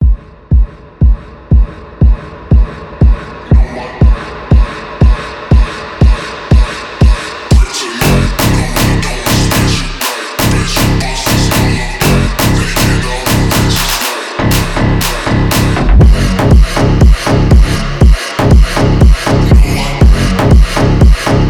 phonk remix

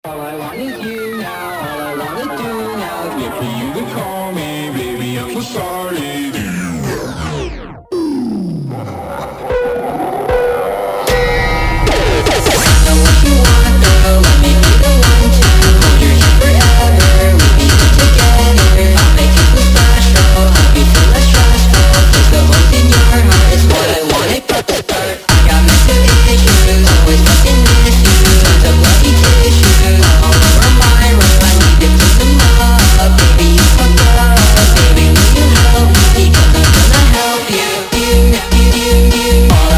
phonk

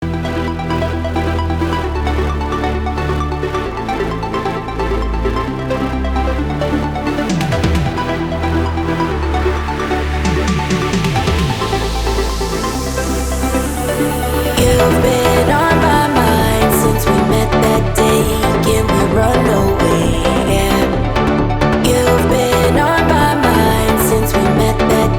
Jet aircraft, Eurodance music

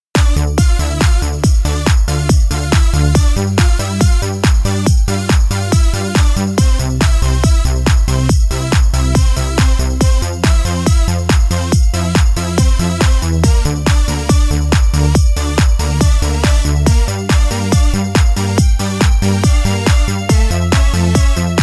skripach noise remix

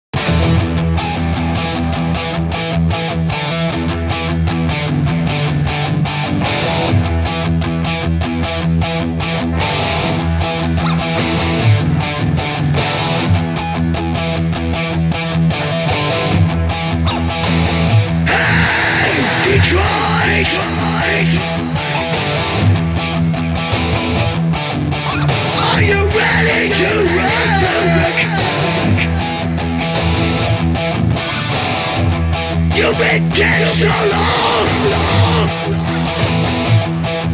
hard rock

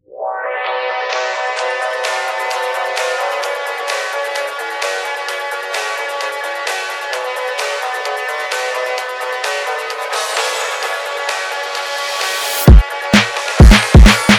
Phonk remix

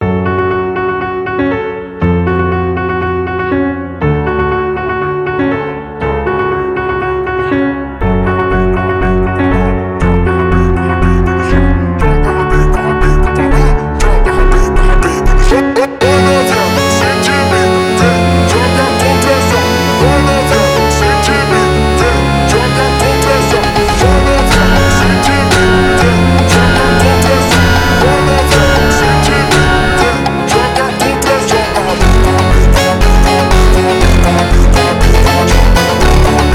FUNK ABNORMAL PIANO COVER

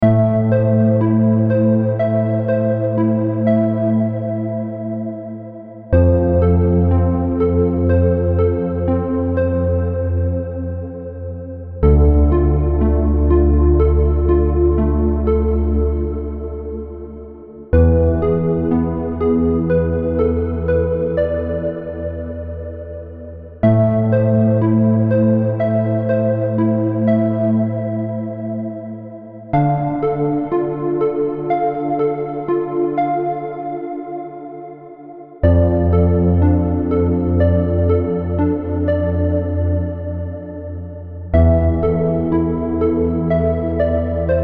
Technohouse Ambient

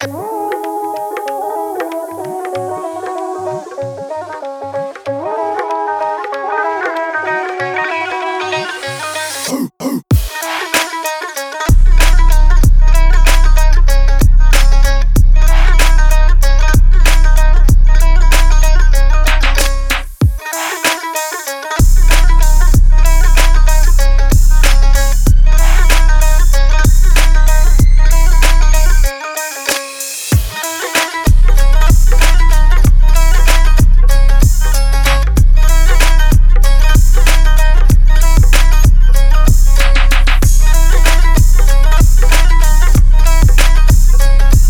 Bass Boosted HD Punjabi Bass

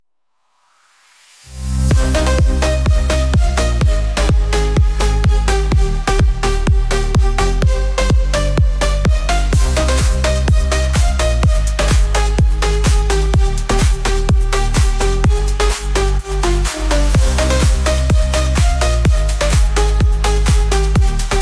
EDM Background Music

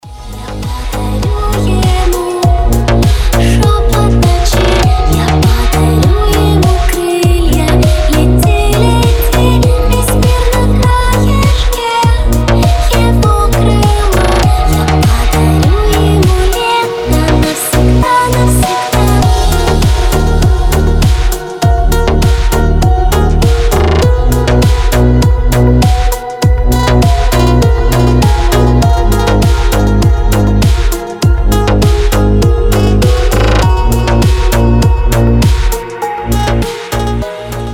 Remix

